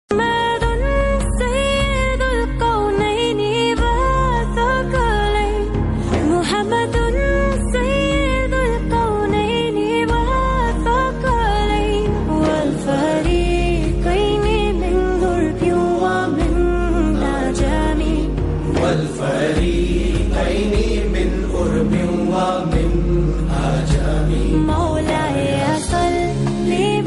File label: islamic song